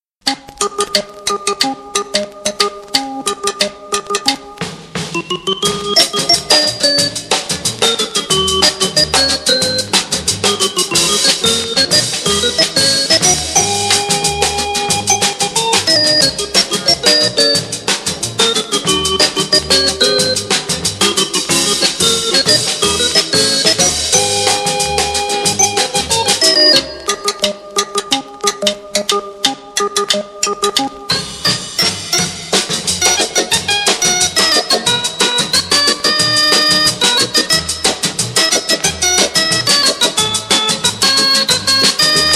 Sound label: BOUNCE SHUFFLE MEDLEY, HAMMOND